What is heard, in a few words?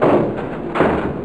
open hatch; U-Boat 1.5.3